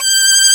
SOLO STRG G4, Strings; POP STRINGS

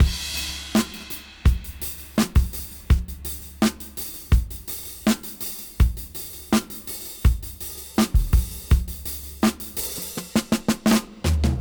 Gresy 82 BPM; AMG - Keith LeBlanc Phat Butt Jams

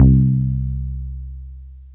MSX BASS, drum; Crypton - DrumBase5000; CR68; MSX KIT

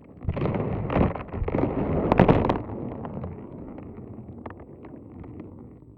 Plastic Tub, contact mic, Marbles; musicradar; contact mic madness; Raw

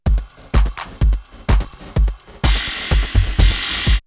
R8 Industrial, drumloops, DrumMachines; X-Static Goldmine

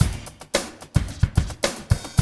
110 tekkn, loops, hyper dance; Best Service

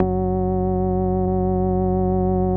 F#1, ORGANS; Music Store Sound Service; Einstein

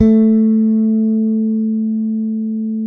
bass, sustain; Sampleheads; Will Lee Bass Library

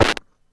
Percussive Hit, contact mic

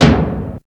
Drum; Coldcut's Kleptomania VOL1

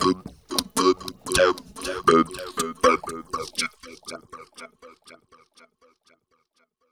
BEAT FX, Funky Vocals

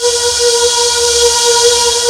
EMU S CHOIR; Rubber Chicken Software Sample Bank

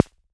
Kay SD, Kay DRM1 drum machine; 300 Drum Machines

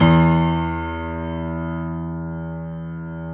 PIA HIGH G, Grand; Sound & Vision; Gigapack I CD 2; PIA GRAND 1

